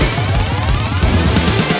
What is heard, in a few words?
BOSS Main Transition to Loop B; Teenage Mutant Ninja Turtles Shredder's Revenge